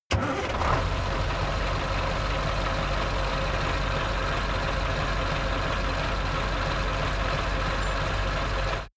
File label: truck ural 4320 start engine idle